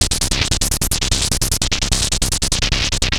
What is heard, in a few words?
Atonaloop, Industrial Analog